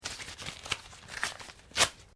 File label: PAPER SHEET FOLD TEAR SHORT; Material components for constructing more complex sounds; Materials